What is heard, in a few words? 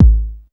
bass drum deep, techno trance drums